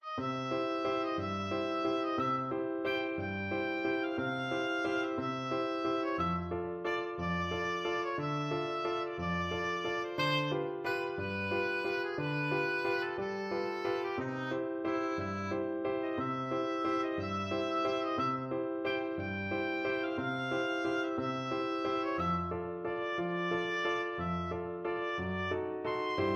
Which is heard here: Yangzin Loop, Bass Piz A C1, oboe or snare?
oboe